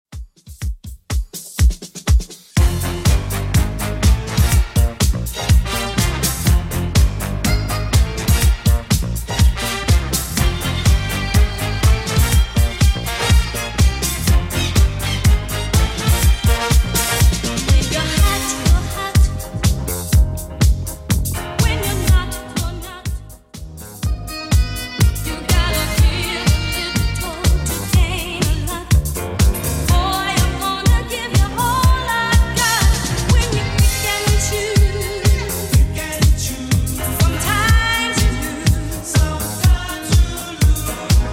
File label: 70s Redrum 123bpm